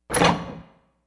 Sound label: doors; Silent Hill 2